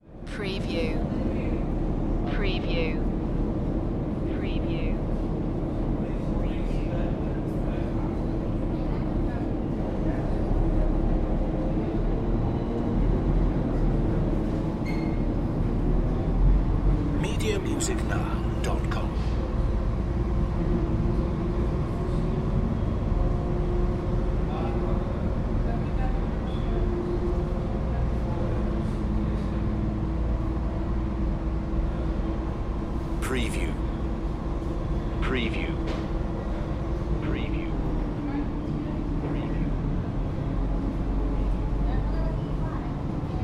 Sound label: Ferry Interior